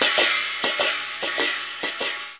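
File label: CHINA, China cymbal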